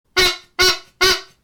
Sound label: sharp Little Squeeze Horn